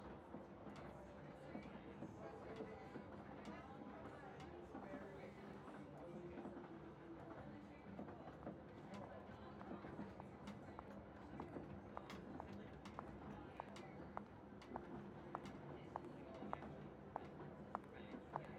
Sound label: Trance